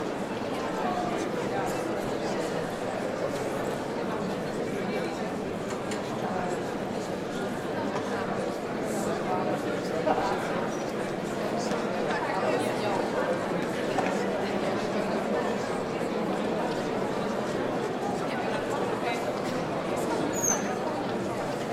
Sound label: crowd ambience; libraryofruina